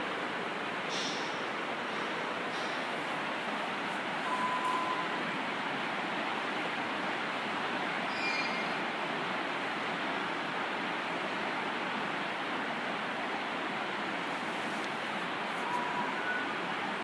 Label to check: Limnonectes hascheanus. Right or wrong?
Wrong